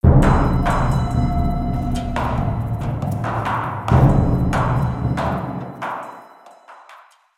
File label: music; Garmadon; cinematics